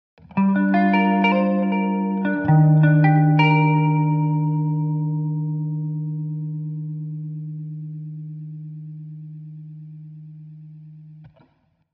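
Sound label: Electric Guitar Melancholy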